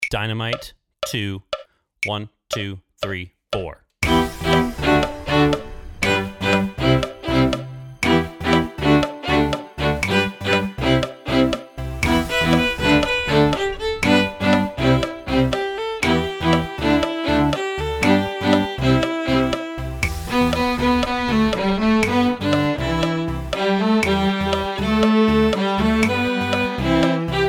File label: PRACTICE TRACK